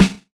SNARE; E-Lab; X Static Goldmine 2; akai

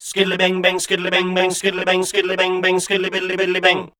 SCAT #F

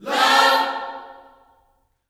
LOVE CHORD, Vocal Gospel

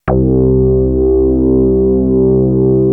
MOOG BAS

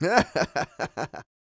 LAUGH, Vocal Jazz Fx

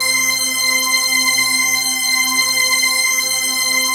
PHASE PAD, ATMO PADS; Best Service; XX Large

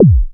SYN-FX

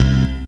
D2 BASS

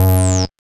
BASS

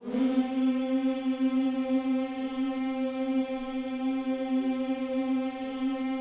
HMMS C 4, Gospel; Spectrasonics; Vocal Planet Vol.1 Gospel; akai

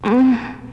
VOC

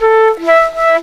D.FLUTE; E-Lab; X Static Goldmine 2; akai

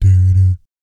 "BS D D", vocal jazz fx

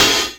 IND

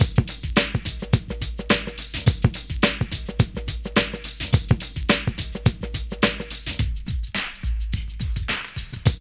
PHAT LOOP; E-Lab; X Static Goldmine 2; akai

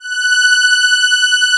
Atmo Pads; Best Service; XX Large

